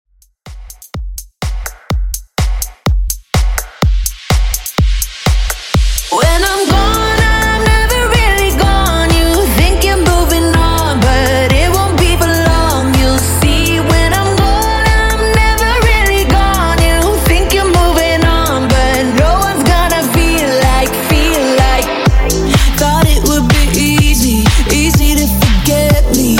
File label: House, 125 Bpm; Redrum